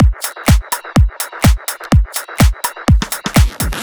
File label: Drum Loop - 125 BPM G, House; Full Drum Loops; Razor; Cymatics